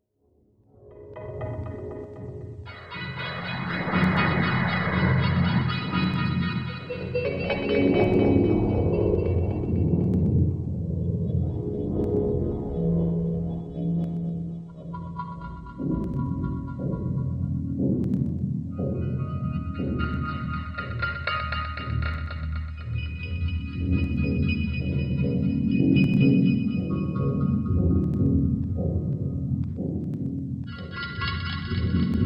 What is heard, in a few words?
Gb Melodic Minor 60, Deconstruction